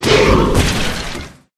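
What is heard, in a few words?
Dragon Skeleton die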